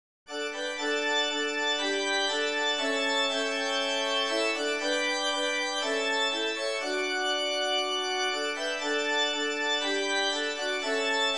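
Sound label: Do Ye Also church and reed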